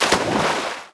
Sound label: Splash; Lara; Playable Tutorial Level